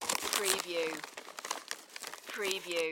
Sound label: Bag Plastic Reach Hand; Foley; sound effects bible